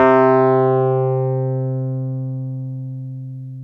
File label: PNO Wurly, C 3; Keyboards of The 60's and 70's